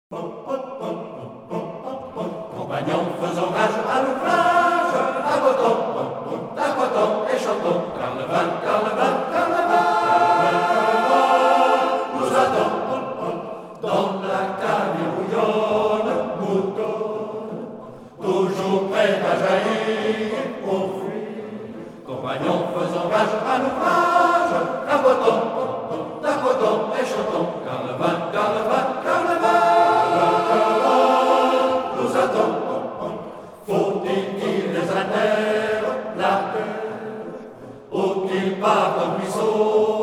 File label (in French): Chant des tonneliers